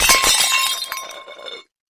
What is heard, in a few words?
Dishes Tray Dishes Cr, clay & ceramic